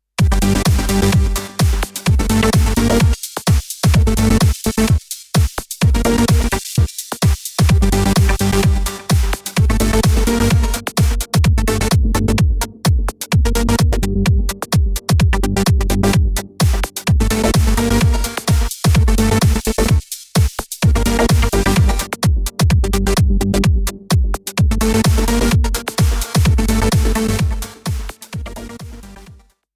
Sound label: Gate; djm 350